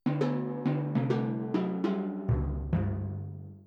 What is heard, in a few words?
Timpano Drum